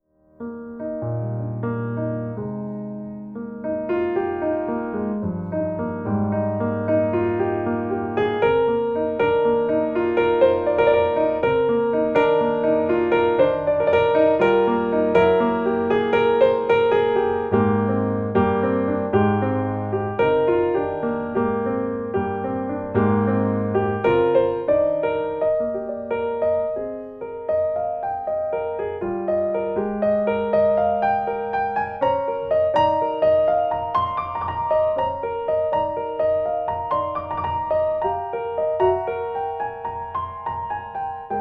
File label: Musicbox; Pony Music Archive 22.10.01; Ponytown